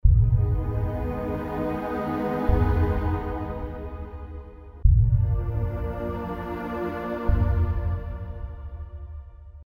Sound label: music